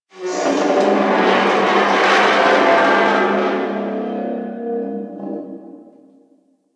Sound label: Asteroid Field Ship Metal Creak A; Warframe; Ambience; The War Within